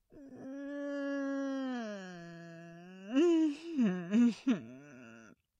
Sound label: groan; devour